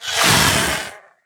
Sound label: Young Dragonhawk Death